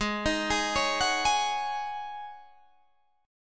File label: G sharp 7th Suspended 4th G sharp, Guitar DADGAD